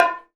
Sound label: BONGO; Univers Sons; Basicussions